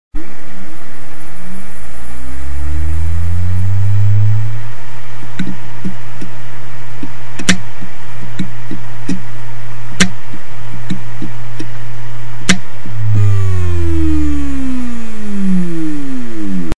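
wd unstable clicking spin down, Hard Disk danneggiato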